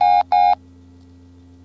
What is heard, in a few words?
phone, office; AKAI S6000 CD-ROM - Volume 6; Tools